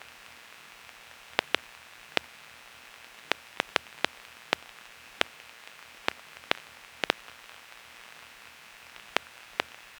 low level broadband hiss t, analog

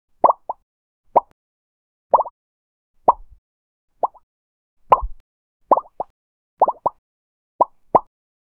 cute bubble pop

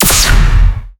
explosion

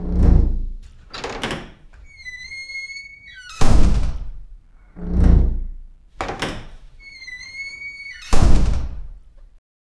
haunted house door open, creak

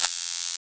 the short and sharp sound, electrical

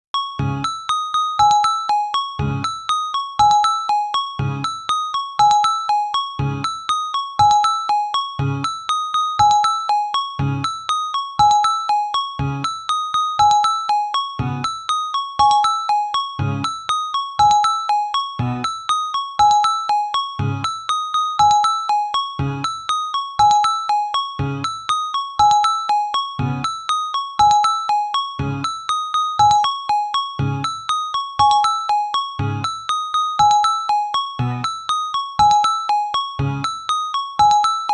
greatest mobile call soun

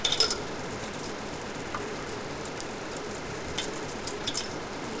rain taps lightly on a, indoors

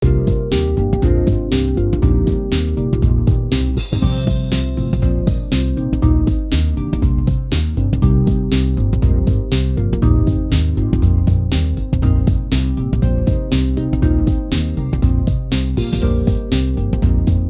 funky groove with sweet c, funk

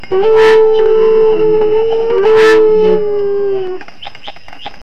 parrot chattering monkey

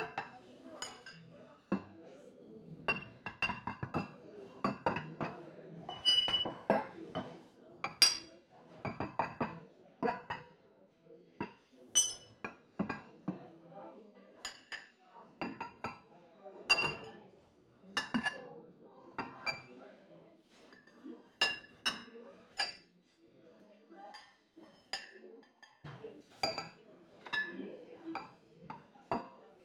subtle restaurant table

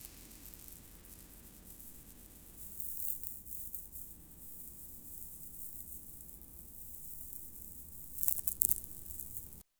the sound should be simpl, grains

